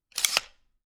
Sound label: Part Assembly; TOOLKIT vol.2